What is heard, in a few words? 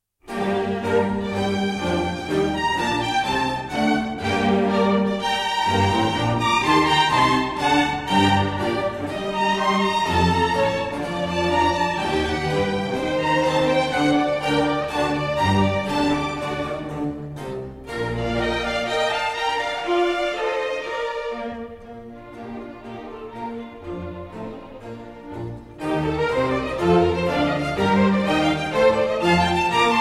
Cello Concerto in G Minor, Allegro; Monn